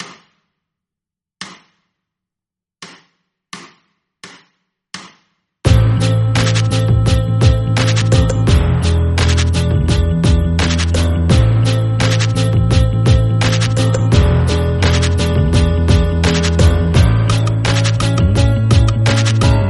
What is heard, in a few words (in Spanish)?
BACKING TRACK